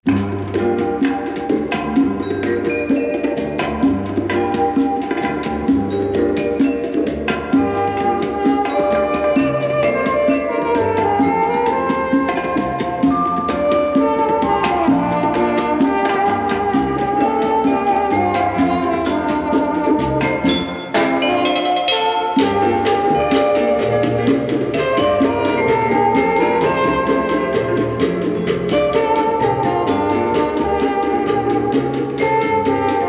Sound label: Carnival Band, Mambo